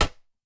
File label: sling metal, attack impact